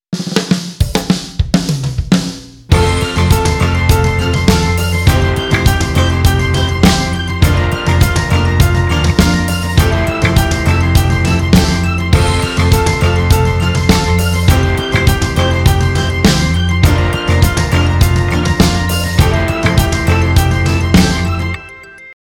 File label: IV T Pedal